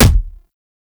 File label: Explosion Punch Generic Small; Explosion Punch Layers; Weapons